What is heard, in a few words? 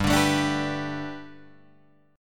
G Major Add 9th, Guitar, Drop D